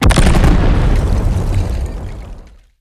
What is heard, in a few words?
black cannon full